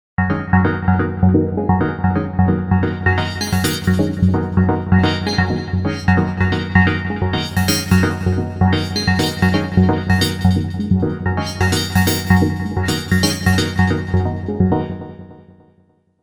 Digi und Sinus Modelle + Filter + Resynator Mod

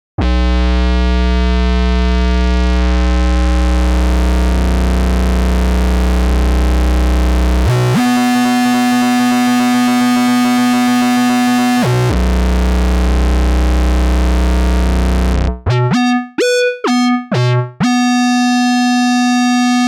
Oberheim SEM VCO3 Verzoegerung; G-Force Software

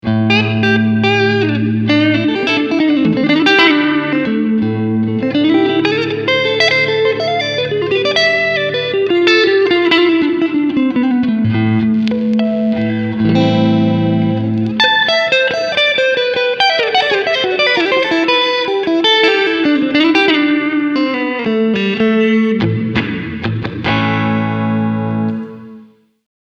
Hall kurz

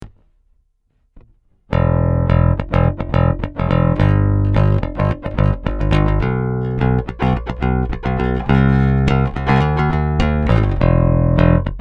Sadowsky NYC5 passiv hals pickup VTC auf

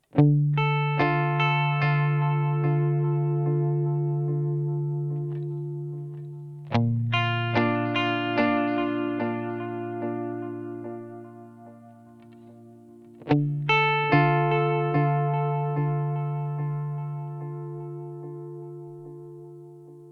Delay mit dynamischer Pan Modulation; TC Electronic 2290 P

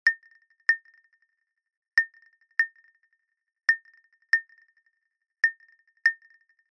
Woodblock sanft

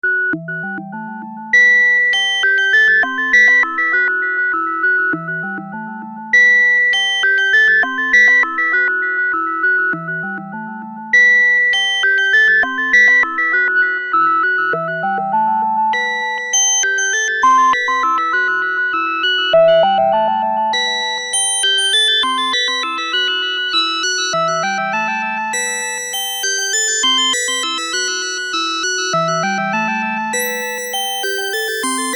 Malekko Manther Resonanz Spielerei

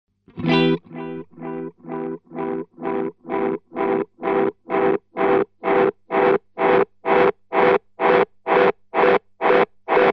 Helle Oszillation; Chase Bliss Audio Tonal Recall RKM 1

